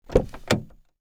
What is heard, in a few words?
car door open; transportation